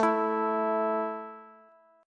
A 5th A down Bass; strummed

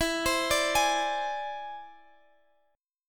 E Augmented 7th E down Guitar Half Step Down

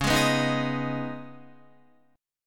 Db 7th Suspended 2nd, Guitar; 8,11,11,8,8,11; Guitar-4F,4A,5C,5G,5C,6E; Db7sus2 strummed

